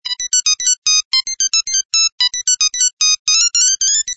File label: ringtone; pacman